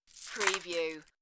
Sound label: Coin Rattle